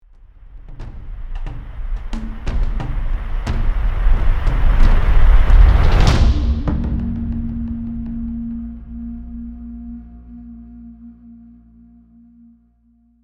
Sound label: Cinematic riser tribal